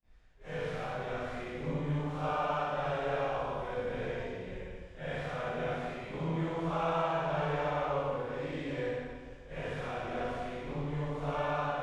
Choir

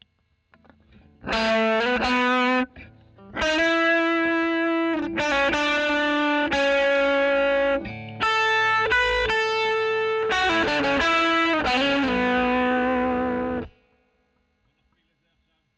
E. GTR Royer OD

